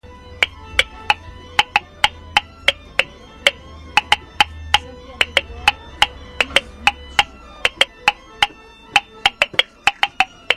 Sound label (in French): grenouille et vielle; St Ceret 2006